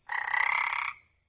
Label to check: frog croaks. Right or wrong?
Right